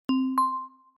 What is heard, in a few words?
payment